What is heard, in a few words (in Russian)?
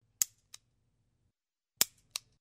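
взвод курка револьвера нажатие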